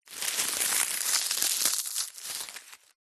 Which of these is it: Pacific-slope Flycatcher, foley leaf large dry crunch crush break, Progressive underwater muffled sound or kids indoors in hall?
foley leaf large dry crunch crush break